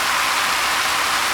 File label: Auto Tires Water Loop; Automobiles